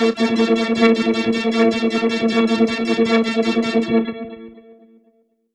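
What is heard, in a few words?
Modulation Processed, alarm siren samples 125bpm; musicradar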